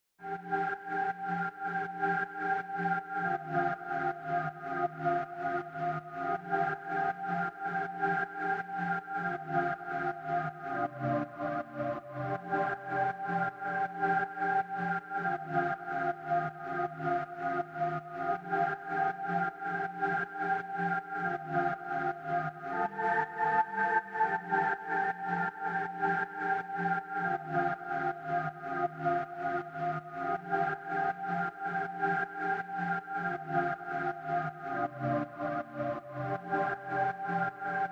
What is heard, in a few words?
Lead with Main Pulse Only